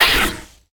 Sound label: hit; toxifin